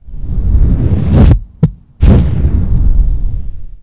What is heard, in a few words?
blackout in; The Elder Scrolls III Morrowind GOTY; XBOX